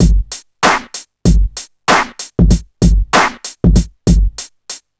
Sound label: 96 bpm drum groove g key, Free breakbeat - kick tuned to the G note. Loudest frequency: 1368Hz